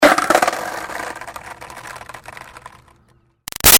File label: Skateboard Drop Crash Short Roll; Skateboarding; Sound Effects:Sports; Big Room Sound